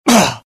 death, pain; dialogue; IT; mohaa

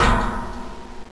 player ladder; xash3d fwgs

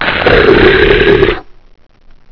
headcrab attack; xash3d fwgs

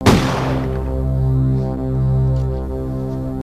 death; singe

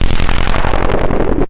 throw; scummvm; The Griffon Legend

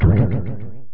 mushroom jump; hurrican

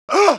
damage, pain; mohaa; dialogue

